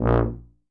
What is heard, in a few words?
A#1 TUBA